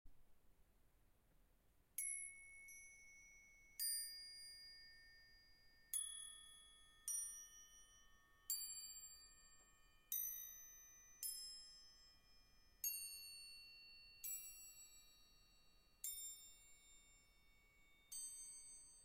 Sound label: brass